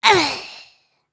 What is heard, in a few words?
Die; Lego Strombie; creatures organic